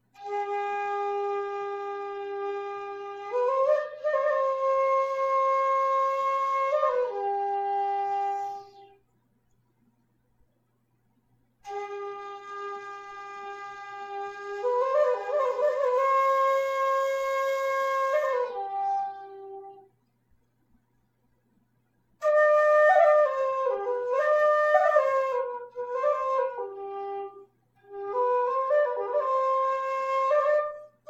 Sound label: bansuri